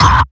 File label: Vinyl Kick; Sonic Foundry (Sony Creative Software); Esoterik Beatz; Vinyl102 Kit